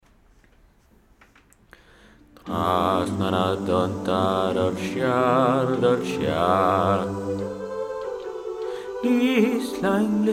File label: basses; trasna na dtonnta